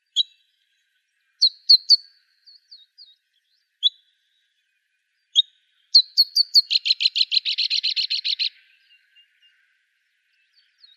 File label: Emberizids; AZFO; deviche